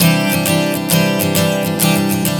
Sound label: Strum 100 C, One Chord Strumming 100 bpm